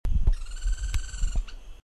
Leptopogon amaurocephalus

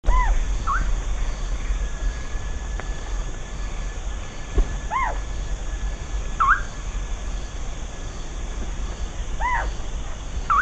Lurocalis semitorquatus

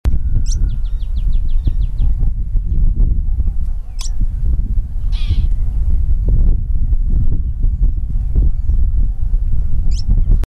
Asthenes pyrrholeuca call note, bahia de asuncion

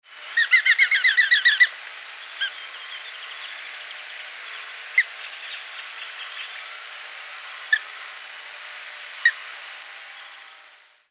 Falco rufigularis song

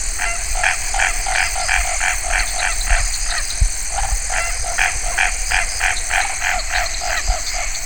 Hypsiboas raniceps

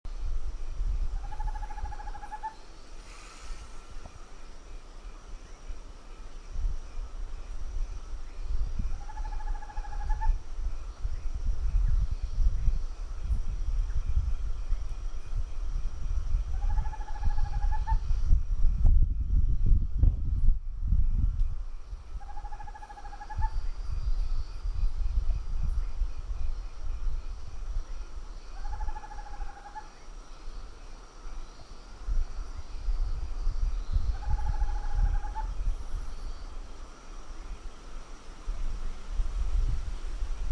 Megascops choliba

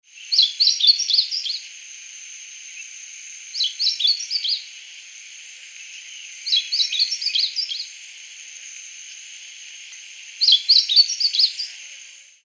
Amaurospiza moesta; Ocampos

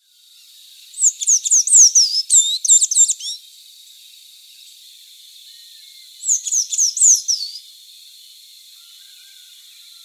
Thraupis sayaca; Quinto Potrero

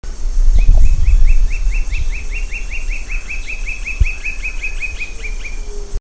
Dendrocolaptes platyrostris

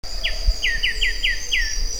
Pachyramphus polychopterus

